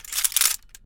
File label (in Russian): perezaryadka pistoleta pered pohodom na delo